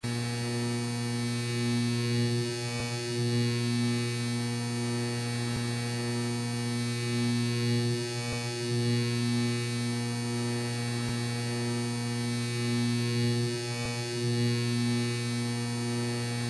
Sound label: electricity